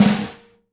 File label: SNARE RUFF, Live Snares; PlatinumPhattCD1; E-MU Producer Series Vol. 8 – Platinum Phatt